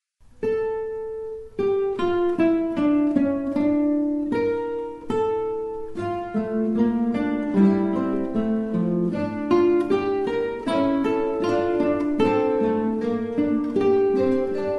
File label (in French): Fugue; Haendel